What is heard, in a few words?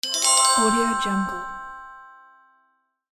diamond sparkle; medusamusiclab